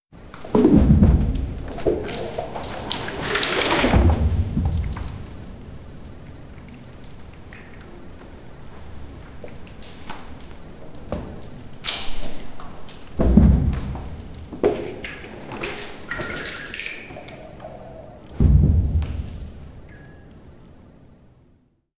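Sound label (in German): Höhlengeräusche plätschern, cave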